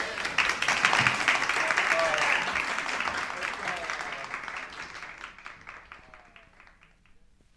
applause

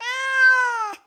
cat meow; Animals

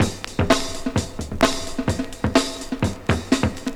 Drum loops, Boombap

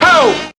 "ho", voice; ooh ahh

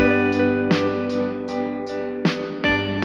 guitar

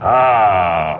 "ah" extra large; Voice; ooh ahh

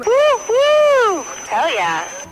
woo woo hell yeah; Voice

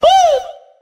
woo female; ooh ahh; ho hoo woo

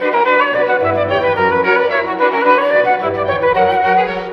flute, Loops

